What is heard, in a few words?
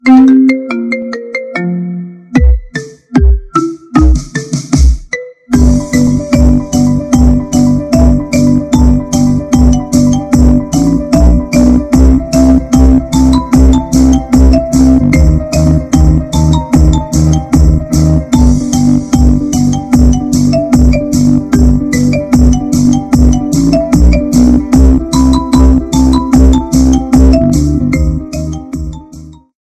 Marimba Remix iPhone 13 Ringtone